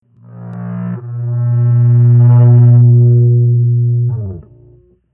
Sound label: Battle SE Round SE; Hylics